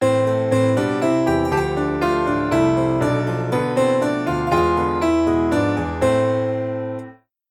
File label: viim